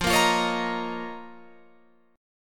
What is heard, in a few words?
F 7th Suspended 4th Sharp 5th F down Guitar Open F; strummed